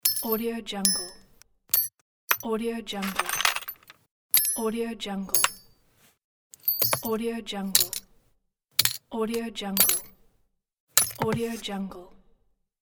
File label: metal parts hits screw bolt